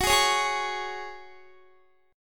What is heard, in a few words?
Gb Suspended 2nd, Guitar; strummed